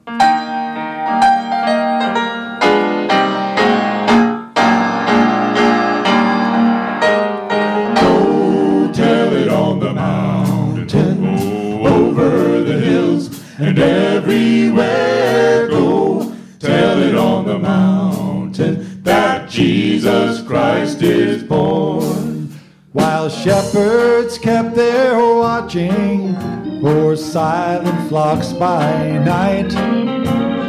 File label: Go Tell It On The Mountain Christmas Quartet